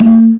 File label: Tabla, Roland JX-305